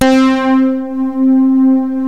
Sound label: ENSONIQ VFX BIG MONEY; Rubber Chicken Software Sample Bank